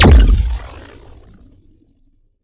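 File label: Sci weapon Shot Medium Blaster Pounder; Robobeat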